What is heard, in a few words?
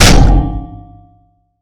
Huge Metal Scrap Bang